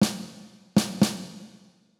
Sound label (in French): Rythme